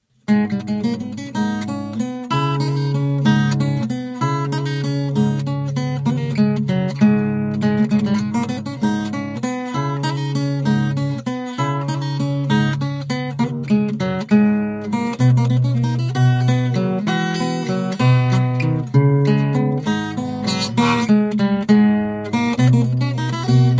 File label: guitar; Soundscape Overhaul